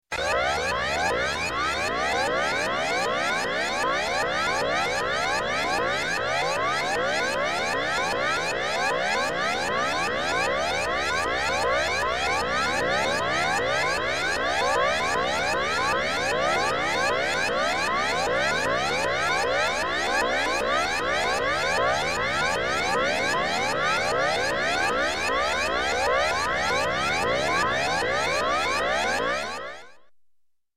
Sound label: Space Warble Tone, Low, Electronic; Sound Ideas 2000 Series